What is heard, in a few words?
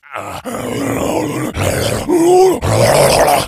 amb; cstrike